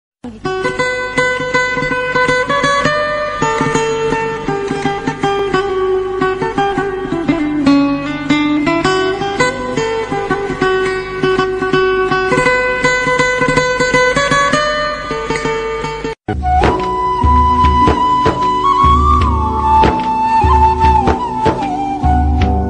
instrumental and flute ringtone; khuda aura mohabbat season 1

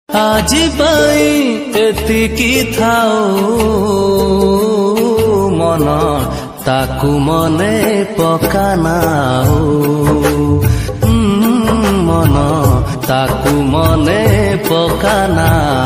odia sad ringtone

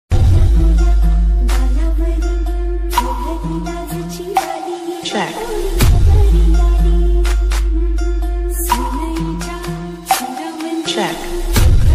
marathi ringtone

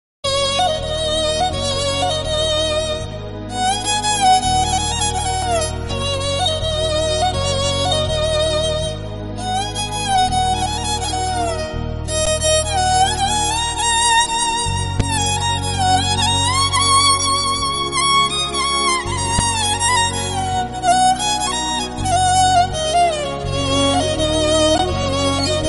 movie bgm music bgm ringtone; sitaramaraju movie; garibha

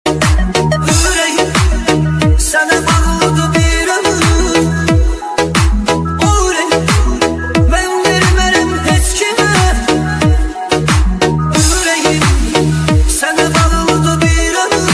music arabic remix

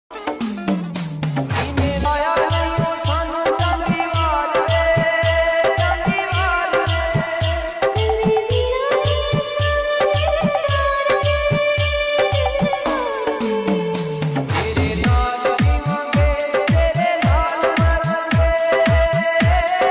romantic song ringtone hindi ringtone dj remix

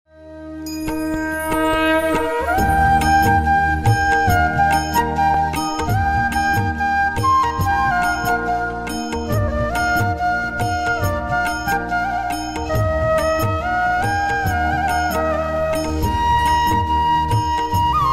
flute instrumental; om gan ganpataye; ganesh chaturthi special 2021

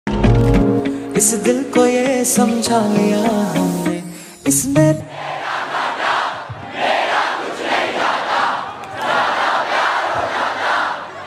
ringtone instrumental ringtone

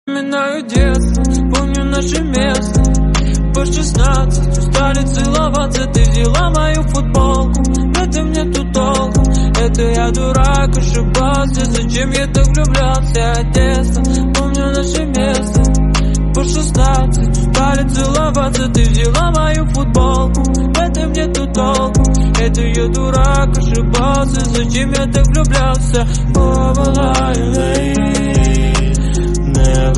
attitude ringtone

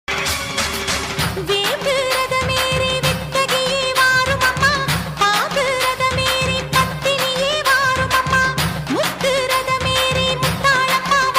devotional bakthi songs; karumari amman

